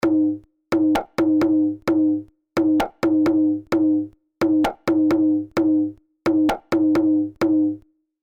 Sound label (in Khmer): ស្គរដៃ Drum; រឿង នំដំឡូងដុត (Cassava Cake)